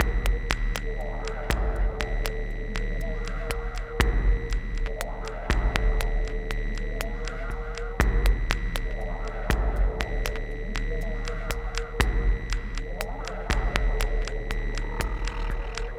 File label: Minimalism, Processed Percussion